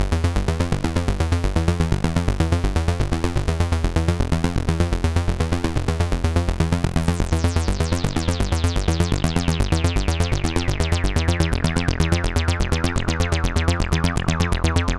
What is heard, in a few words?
Random Loop Acid Bass multiple samples; SuperAcid